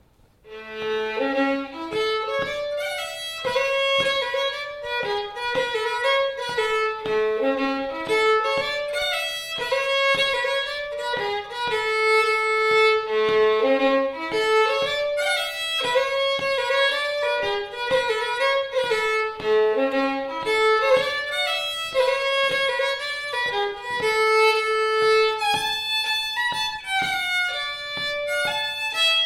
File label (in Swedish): polska; Sparffars